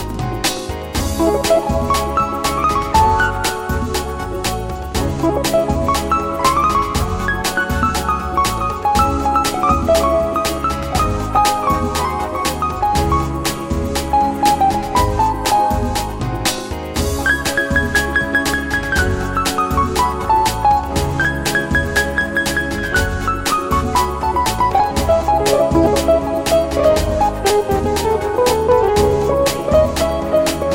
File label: Modern Tango